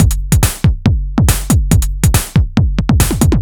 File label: Analog 140 bpm, retro drum machine samples, Loops